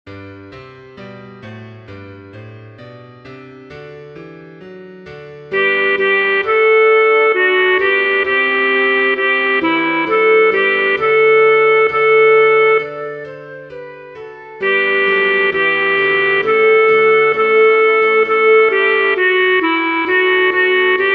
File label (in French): Agnus Dei choeur Alte; Messe Des Morts; Concert du 13 décembre 2024 en l'Église St Pierre - St Paul